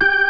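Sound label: G4 fast power, power B3; Northstar - New Gold VOL-1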